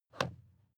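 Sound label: sports car interior pedal clutch release soft; verkehr transport; autos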